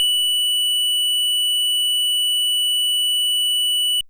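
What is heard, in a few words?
FM Sq Dist DX100 F#0; square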